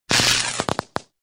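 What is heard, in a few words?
short circuit, electric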